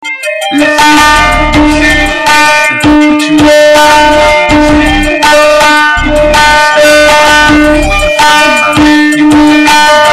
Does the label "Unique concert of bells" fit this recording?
Yes